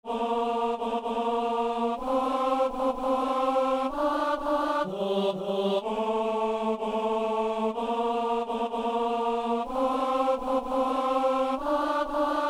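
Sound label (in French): ténor; messe de san lorenzo; gloria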